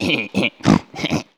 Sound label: hehe grunz